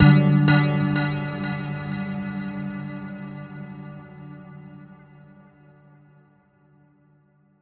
126 bell echoes c; SYNTH AND ELECTRONIC LOOPS

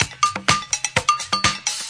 126 bpm, tinny latin con agogo

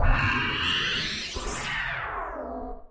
powerup n down noisy; Various Strange Sounds and Effects

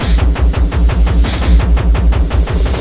170 hardcore, drum loop; drum loops and breaks; 161 to 180 bpm

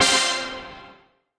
Synthesizer Middle C Notes, Lead

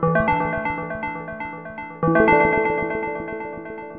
two tones simple arpeggio; SYNTH AND ELECTRONIC LOOPS

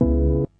mellow stab; Punches Hits Discoblasts

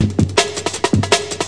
161 fast; 161 to 180 bpm

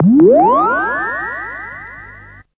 Electro FX; Ueb HE Electro FX

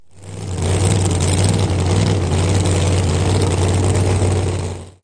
dragster idles; Sound FX Real Life

